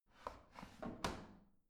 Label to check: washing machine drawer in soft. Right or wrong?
Right